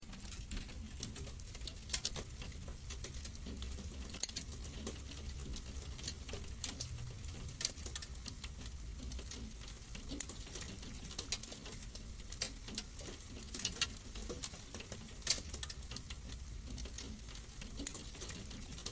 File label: Hail; UniStorm 3.0; Weather Effects